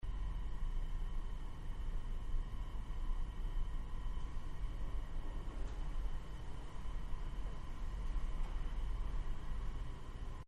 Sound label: Room Ambience; Room Tone; Backgrounds; Partners In Rhyme